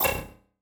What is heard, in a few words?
sensor detect, sensor grenade; arccw go